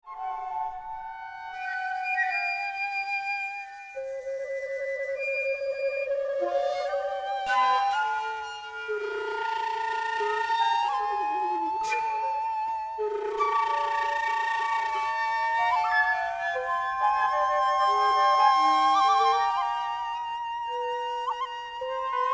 Flute Dream

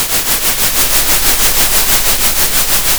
center, Sound of a train

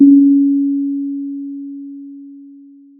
Sound of a church bell

